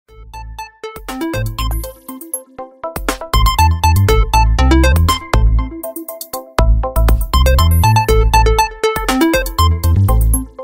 lenovo fantastic clang ringtone

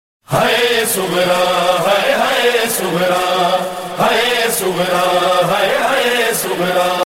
noha ringtone; haey sughra

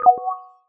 lg w10 alpha notification ringtone

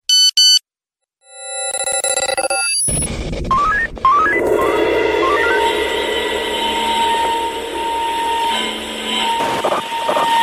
space message nokia ringtone

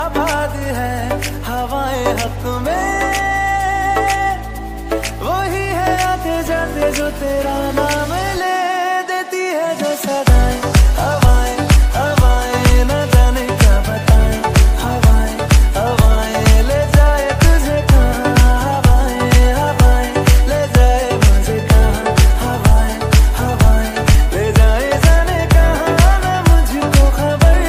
new bollywood ringtone

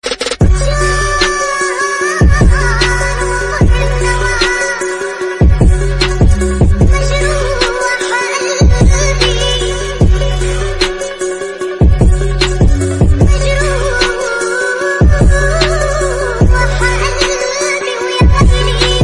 arabic trance remix ringtone

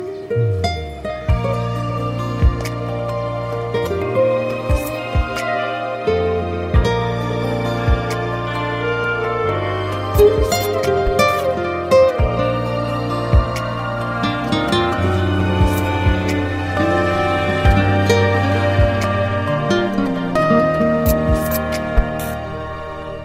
bollywood ringtone

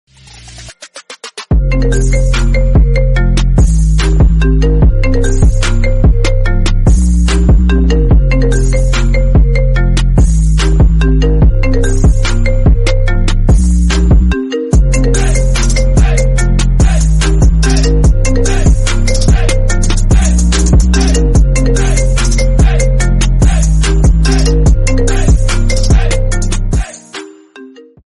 iphone 13 pro max remix mobile sound ringtone

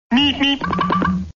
road runer beep beep ringtone

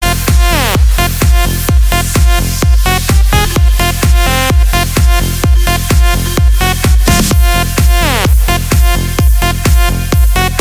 dj remix party dance music tone ringtone

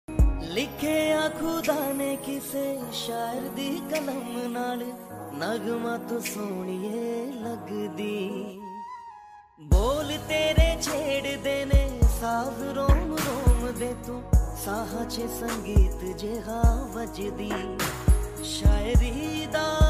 bollywood love ringtone

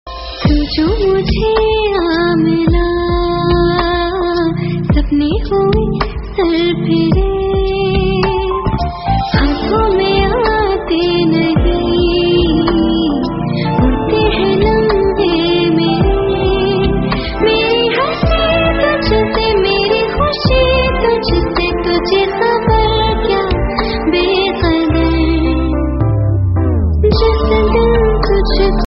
hindi love song ringtone

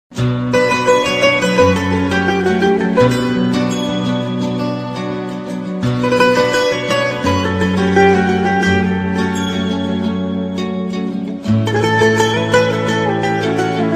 cool guitar ringtone

